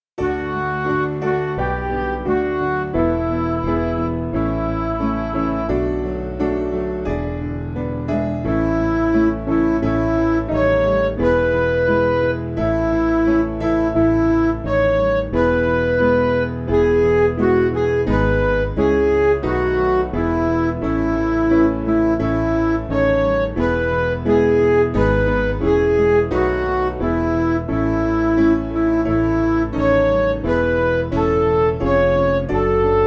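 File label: Music Backing Files for Churches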